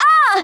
Ranger Death

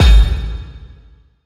ChainedFist Attack

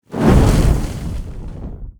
Fire Lord Attack

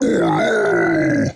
Mudman Death

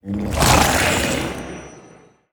Sea Serpent Death

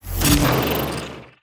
Scorpion Death

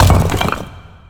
Cursed Casket Attack

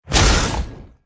Quill Shooter Attack

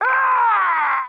Peewee Death

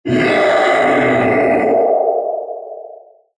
Ocean Templar Death

